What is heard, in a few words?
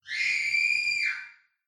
Scream Female